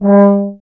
tuba; Miroslav Vitous - Solo Instruments VOL-2

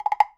Temple Block Hi; Temple Block Trip Hi; Sonic Foundry (Sony Creative Software); Joe Vitale Latin Percussion

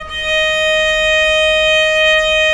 VL D#4, VIOLE, Historical Instruments; USB Soundscan vol.23

